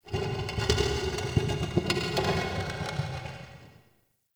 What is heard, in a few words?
Stone Movement, Crypt Ambience; One Offs; Champion Crypt